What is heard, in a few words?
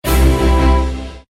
WIN; Sizzling 6